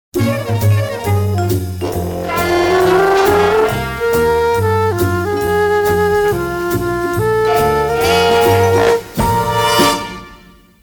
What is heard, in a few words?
jazzy flute